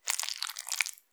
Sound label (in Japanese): 挿入or引き抜かれ終わる直前 膣内射精後or興奮時; 挿入or引き抜く